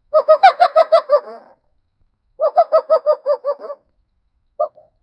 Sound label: fox deer voice laryngeal, animal vocalization